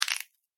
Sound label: horror bone finger snap, Finger/Small Bone Break; Breaking; Objects; McKinney Sound